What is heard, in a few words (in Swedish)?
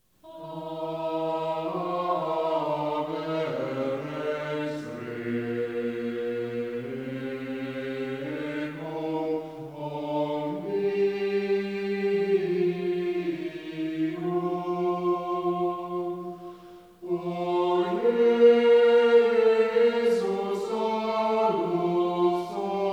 Ave Rex Kör; Kronhuset, Göteborg